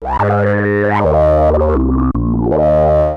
VOCODIZER; Syntec; Wall of Sounds; TECHNO-BOX

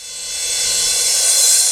rev cymbal; Ueberschall; Techno Trance Essentials; DRUMS

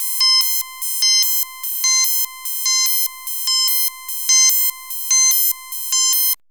RHYTHM FM HPF

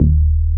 CROMA, Synth Bass; BA1 Synth-Basses; Sound & Vision - Gigapack I CD 2

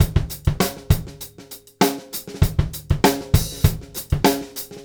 Straight Kit, World Pop Beats

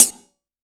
G#, synth line; 90 s Sample CDs; NiceBeats; SynthLine VOL-3

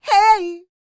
D BIT, Vocal R&B Dance

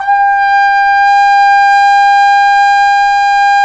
Cup Mute Dry, Cup Mute Tpt; Roland L-CD702

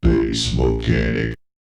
Vocal; ZERO G - Datafile DISC 1

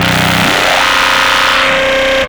RUMBLE, Hard & Loud Techno; USB Soundscan vol.01; AKAI

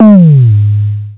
TOM, Drums Dance & Groove; USB Soundscan vol.11; AKAI

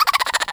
Mouth Fx, Vocal

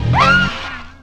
Sceeam; Zero-G Jungle Warfare 1, 2 & 3; 90 s Sample CDs

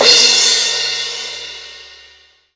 CRASH, Crash Cymbal; MONO CRASH; AKAI S6000 CD-ROM - Volume 3

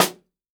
snare drum, jungle snare chromatic; Best Service ProSamples vol.43; Real Drum Kits

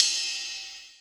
CHH, Dance Drums; Best Service ProSamples vol.15; AKAI

